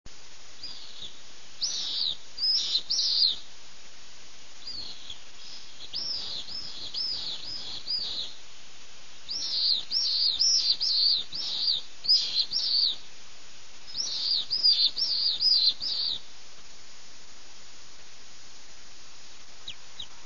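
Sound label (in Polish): jerzyk blady, głosy; Apus pallidus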